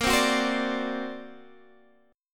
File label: D Augmented 7th A sharp, guitar